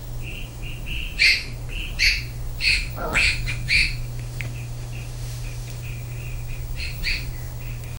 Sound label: baby pandas calm voice; ambiance